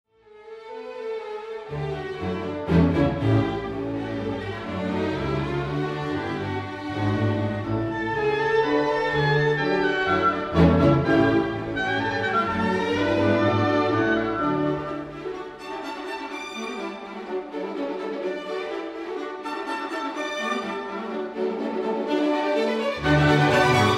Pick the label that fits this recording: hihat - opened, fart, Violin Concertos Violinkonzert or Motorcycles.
Violin Concertos Violinkonzert